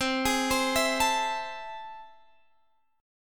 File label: A Minor Major 7th, C